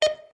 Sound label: timer beep